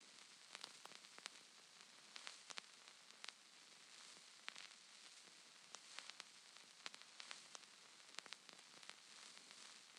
Lofi Vinyl

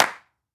Acoustic Clap

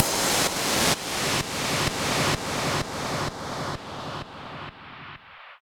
XF Cym FX

